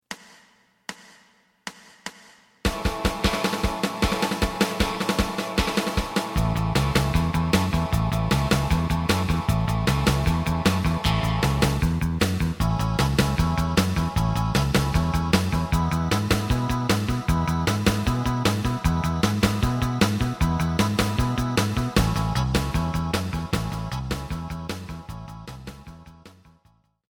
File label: lead